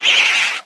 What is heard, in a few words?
Metroid Vox